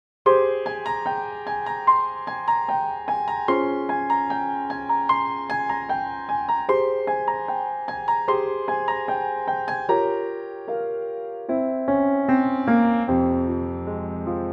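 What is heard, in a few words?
piano Fm, male; key - Fm - vocal range - G to Db; piano only arrangement; theme from the 1970's movie "Love Story"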